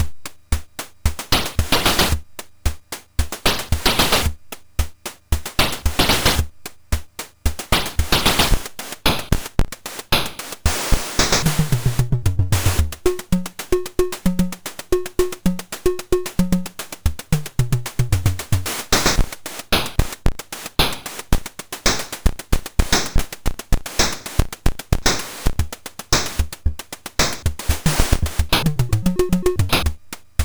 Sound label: sid MOS6581R4